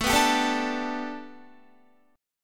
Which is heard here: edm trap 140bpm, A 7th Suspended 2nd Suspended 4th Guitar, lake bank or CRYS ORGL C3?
A 7th Suspended 2nd Suspended 4th Guitar